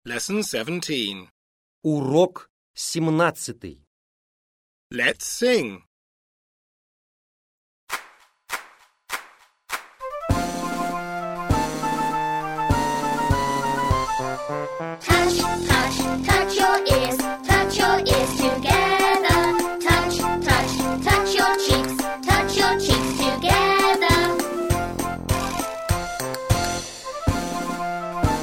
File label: Song Clap